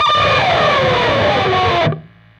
PRS SCRAP, Guitars; PRS SCRAPES

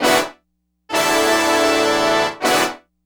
Horn, Keyboards; Horns

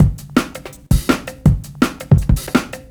Drum Loops, 165; BottleCap; M8; Electronisounds

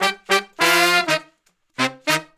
Horn Section

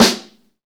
snare, Roland

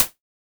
Hi-Hat Pedal; ANTENNAS

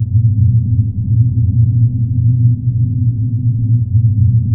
RUMBLER; Spectrasonic Distorted Reality 1

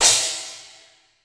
METL CY, METALIC DRM; 90 sSampleCDs; Crypton - DrumBase5000

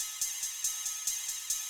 Ride, Club Techno, 140 BPM; Zero-G; Construction Kits

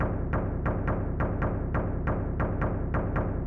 CONTAINER, Techno 138 BPM; Best Service ProSamples vol.54; AKAI; HELLS DOOR

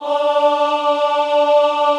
AHH D3, Classical Choir; Best Service; Extended Classical Choir

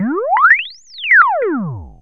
BLEEPS UP DOWN; Zero-G Ambient 1

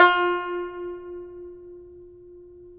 tar; Roland - LA Composer vol. 3

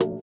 VIBRA; Spectrasonics; Metamorphosis

